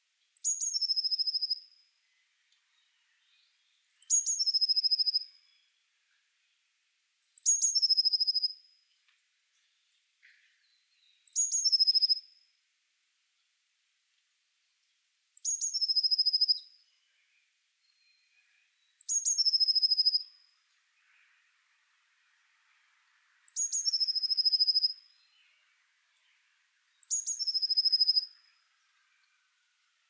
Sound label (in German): Gesang Blaumeise Cyanistes caeruleus